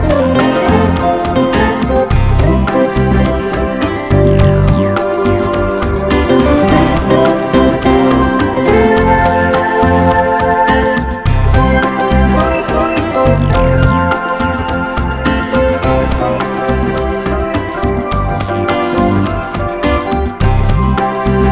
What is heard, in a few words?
Wonderful Smooth Jazz